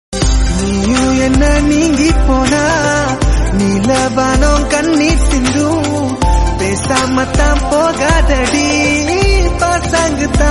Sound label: love song tamil